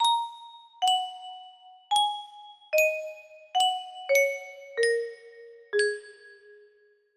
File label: quick and simple, music box melody